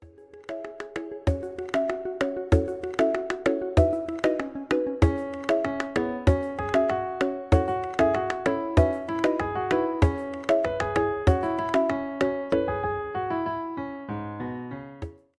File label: iPhone, ringtones; iPhone-18